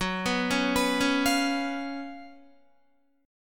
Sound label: Gb Major 7th Suspended 4th Gb, Guitar; arpeggiated; 4F,4A,5C,5G,5C,6E; JGuitar